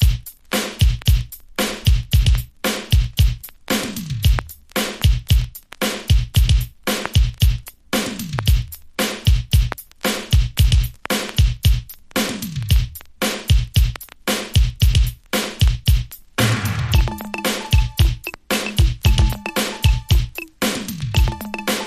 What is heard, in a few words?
BONUS BEATS